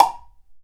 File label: Block